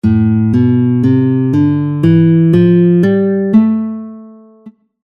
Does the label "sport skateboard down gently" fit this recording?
No